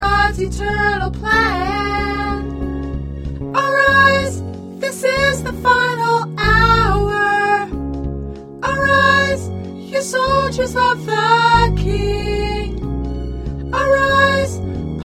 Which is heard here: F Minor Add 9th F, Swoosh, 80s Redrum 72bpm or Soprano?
Soprano